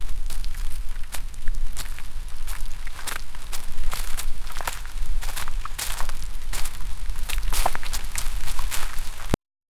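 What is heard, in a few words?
wet running footsteps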